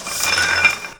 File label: MACHINES